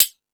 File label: House Machine, E-MU Pro-Cussion